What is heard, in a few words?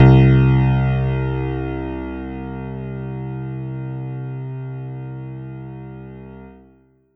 Ensoniq VFX-SD Classic Piano C2